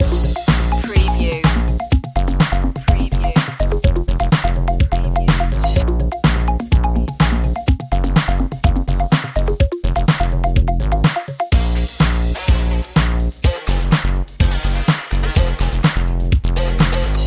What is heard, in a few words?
pulsating and uplifting loop